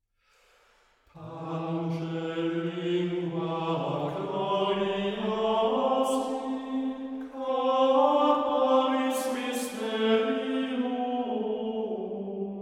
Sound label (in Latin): Hymnus Pange Lingua; Chant - Missa Latina